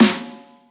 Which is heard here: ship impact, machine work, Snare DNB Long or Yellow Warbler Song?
Snare DNB Long